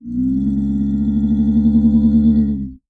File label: Animal Mammal Carnivore Cat Snow Leopard Growl; animals; AdobeCC